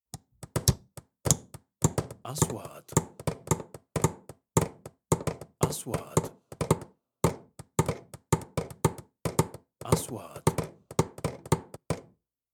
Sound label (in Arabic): مطارق مجموعة; sound effect